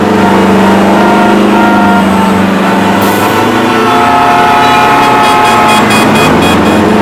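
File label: city ambience; US Open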